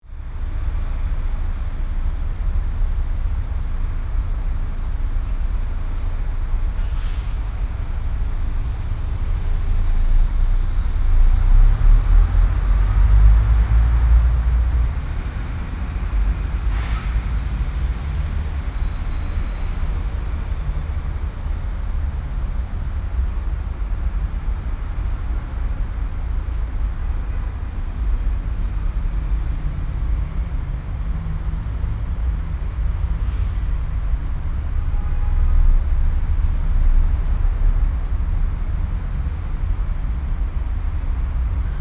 City ambience street avenue near a factory

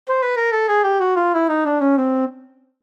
Cartoon descend

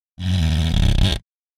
Plastic squeak and creak

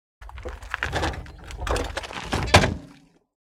Scooter lifting off the ground

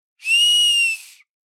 Human whistle short fingers call attention

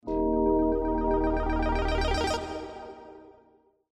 Level completed

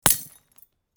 Lightbulb break shatter explosion; torch sound effects

